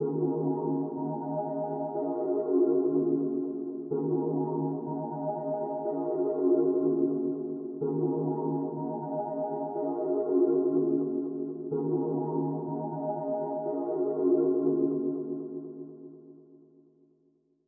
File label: TEXTURE 123 BPM D# Min, Ear Candy; Angels; Cymatics; GHOST (Beta Pack)